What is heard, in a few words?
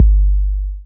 Bass Drop, 808; Street Anthems Sound Kit